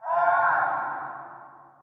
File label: city scream; ambient; source engine; hl2; streetwar; video games